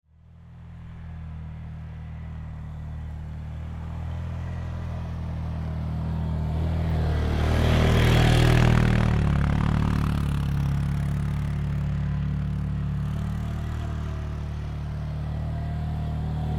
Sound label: ATV Activity, Sports, Atv, Activity, Polaris, 500Cc, Four, Wheeler; ATVs; Blast Wave